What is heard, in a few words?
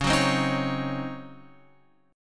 C sharp Major 7th Suspended 2nd Suspended 4th, Guitar, Open F; C#M7sus2sus4; strummed